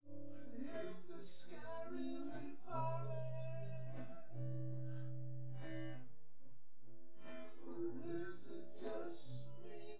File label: nodrums